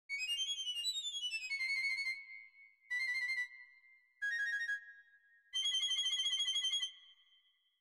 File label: piccolo; garritan; sibelius sounds essentials